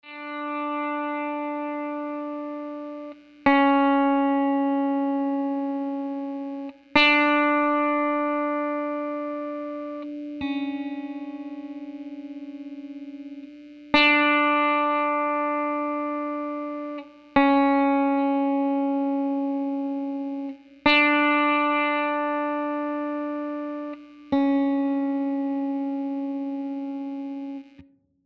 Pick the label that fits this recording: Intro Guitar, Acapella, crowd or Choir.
Intro Guitar